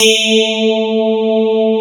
sparkles

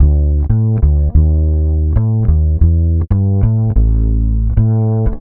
Vintage Fretless, Electric Bass

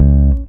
E bass (short C#); Sonic Foundry (Sony Creative Software); Ugly Remnants VOL-2; Basstuff

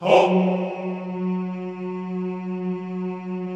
CHO F#2, Vohmm Hohmm; Sound & Vision; Gigapack I CD 2

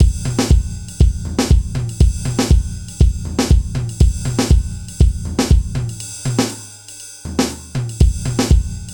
JAZZ, Funky; INIS

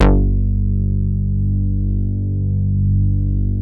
MOOG BASS G2; Best Service Dream Experience

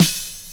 SD, HIP, Dance Mega Drums

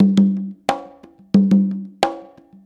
90 CONGA, PERC

